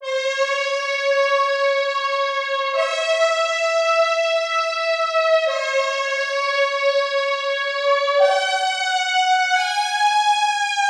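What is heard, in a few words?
Melody Loop, 88, F sharp minor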